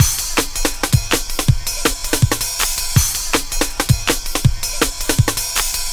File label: 162 Break, Loops; m8 breaks